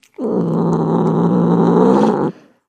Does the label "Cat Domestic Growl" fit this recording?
Yes